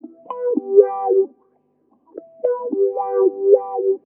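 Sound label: guitar 1, 112 bpm, Funk; USB Soundscan vol.41; AKAI; Roots Funk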